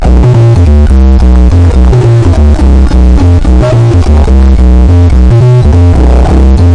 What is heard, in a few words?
142 electro bot bass, BASS LOOPS